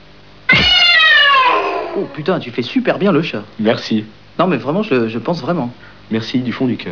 chat

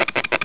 grince

